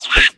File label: chimpanzee attack